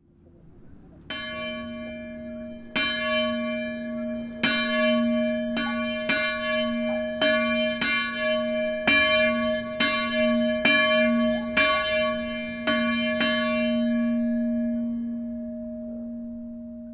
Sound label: Chant; Pithavinteyum; ordo cantus